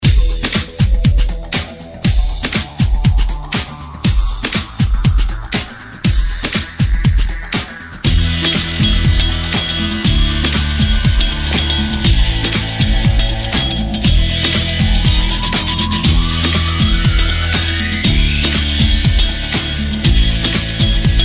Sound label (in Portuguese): rock nacional medley